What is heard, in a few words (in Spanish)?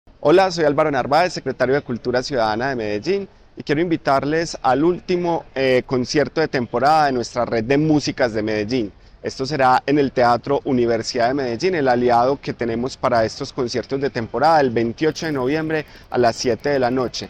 rap sinfonico